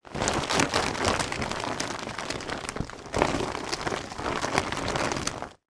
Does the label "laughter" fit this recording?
No